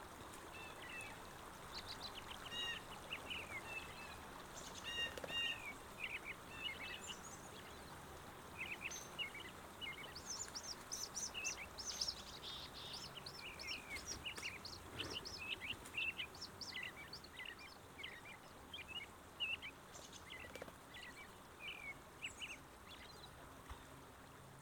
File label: nature soundscape